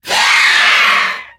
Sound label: Enter Play